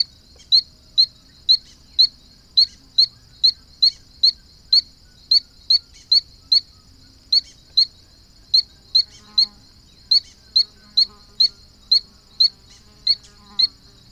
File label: Ameerega berohoka